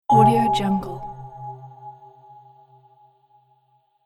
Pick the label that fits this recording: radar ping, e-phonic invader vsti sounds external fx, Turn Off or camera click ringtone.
radar ping